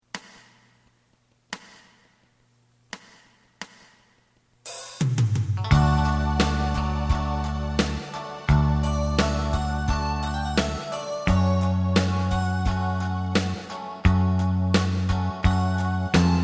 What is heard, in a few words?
lead